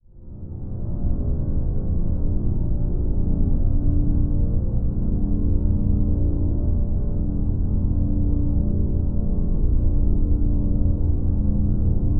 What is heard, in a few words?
Drone Synth Square Wave Rumble; Production Element Imaging; AdobeCC